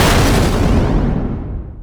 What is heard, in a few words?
Game Over; beatmania IIDX 12 Happy Sky; Arcade, PS2